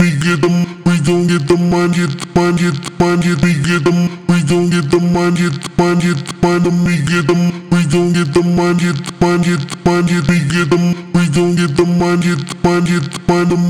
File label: vocal chop, 140, F; GetTheMoney; Slate Digital Omnivox Vocal Sample Pack; Chops